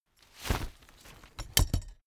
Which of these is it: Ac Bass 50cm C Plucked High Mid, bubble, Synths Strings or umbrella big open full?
umbrella big open full